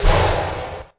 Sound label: gun shot; Crash Bang Boom